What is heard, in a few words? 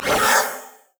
wraith angry; LoL; monsters